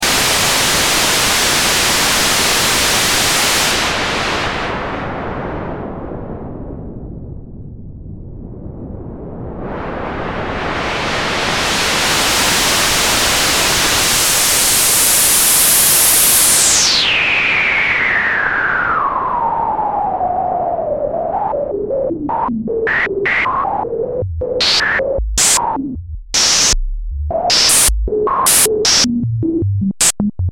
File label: SH01a Noise Advanced LFO Sample Hold